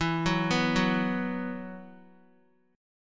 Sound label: E Suspended 2nd, Guitar; Esus2; arpeggiated; x,7,6,4,6,x